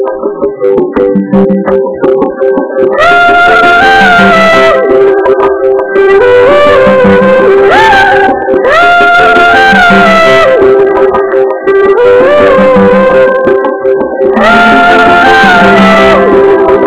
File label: chorus super low quality